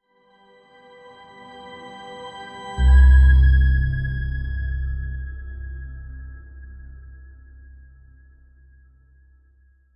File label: suspenseful build up